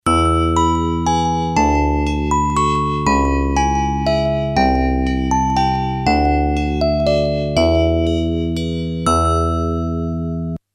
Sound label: game over